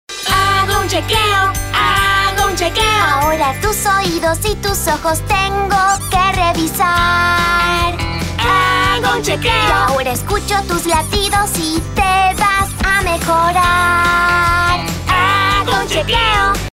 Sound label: Songs